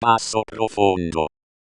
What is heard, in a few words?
basso profondo; ringing of a hut-sized bell; The roḫ pealed — softly, but powerfully enough to rattle axis’s bones